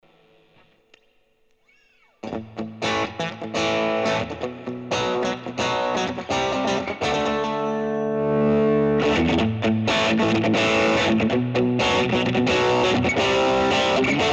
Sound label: Fender Blues LTD Dist Hall